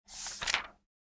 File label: Turn Page